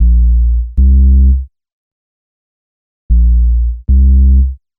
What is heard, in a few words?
Smooth Bass; Sonic Foundry (Sony Creative Software); Knutrix Drum & Bass